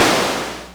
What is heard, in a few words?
Clapbox Noise; Ableton Foundation FreePacks